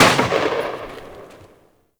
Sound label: Tail, Lever Action Pistol; Warframe; Grineer